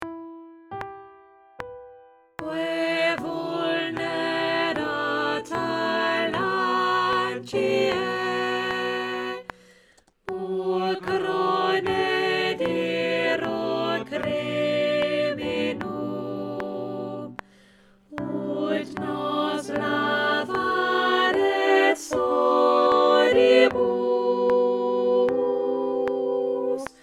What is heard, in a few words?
alto